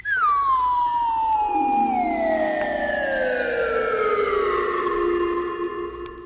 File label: whistle down